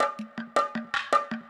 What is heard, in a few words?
PERCLOOP ETHNO 160